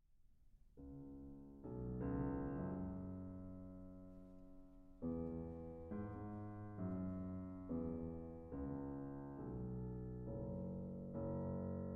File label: Passacaille